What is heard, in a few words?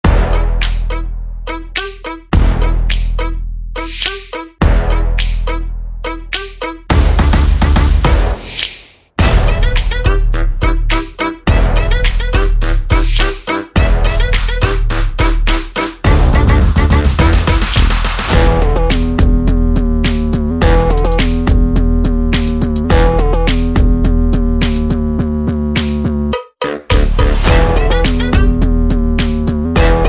No Lead Synth